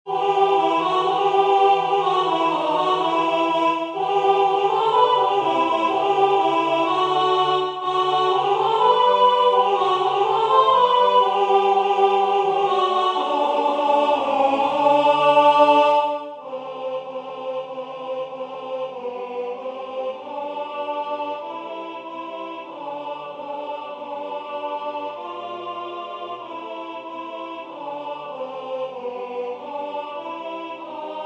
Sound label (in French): alto